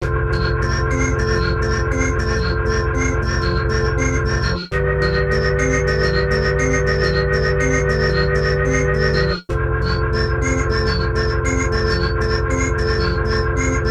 Sequential; Sequential Take5

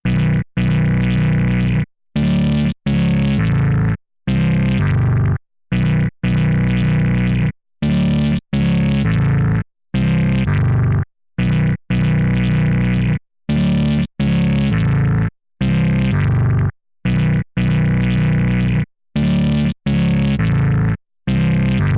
Behringer K2 Ext In Bass Loop CV2 LP HP Resonanz

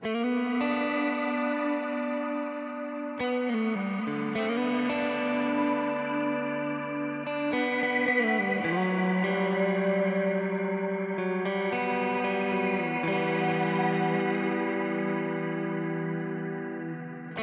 Reverb Bloom

